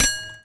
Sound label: bell